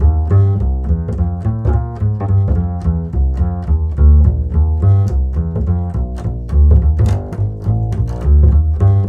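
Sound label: walk D#, 212 brisk walk, bass; Spectrasonics; Bass Legends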